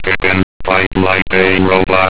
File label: chicken 8khz